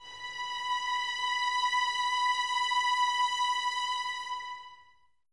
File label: violins B 5, String Ensembles, 23 Violins; Miroslav Vitous